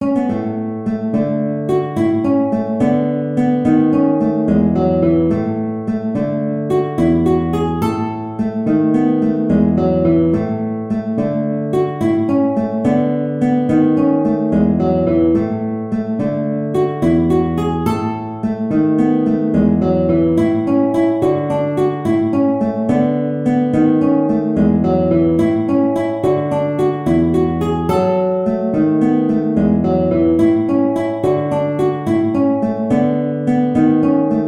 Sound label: guitar